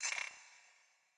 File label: movement run; smite; vamana